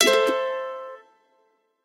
multiline win; Hot Party Deluxe; Wazdan

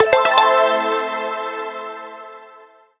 multi line win; Sizzling 777; wazdan

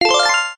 WIN; Kings Crown

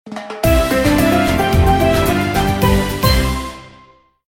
WIN MAX; Beach Holidays

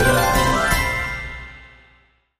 extra freespin win; Fishing For Gold

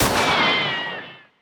win shot; Wild Guns

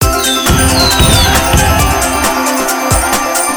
countup; Blue Dolphin

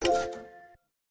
click spin; Hot Spin Deluxe

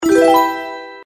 multiplier increment; Rarities

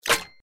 expanding symbol hit; Robots

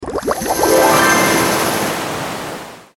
UI wave; Paradise

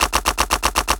gambler risk rollcard long loop; Fruit Fiesta; Wazdan

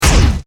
UI shot; Paradise

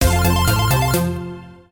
win; Golden Joker

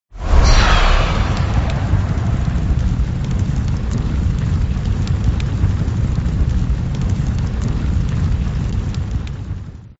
buff fire; Thunder Fighter

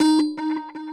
spin scatter; In The Forest; Wazdan

